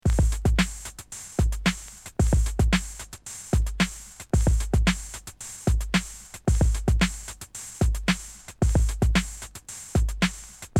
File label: beat